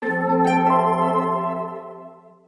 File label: ps4 menu intro